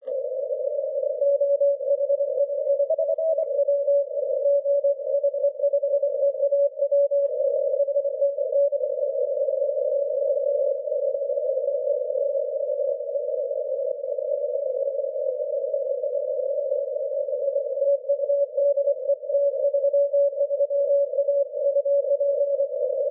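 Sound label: 30m continuous wave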